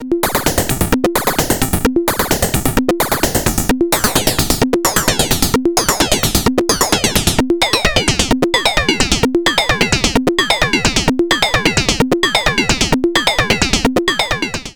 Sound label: ACL Multi Function Discrete VCO FM Exp Sync sine